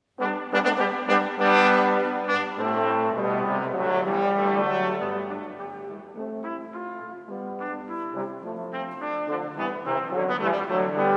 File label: chamber brass; stockholm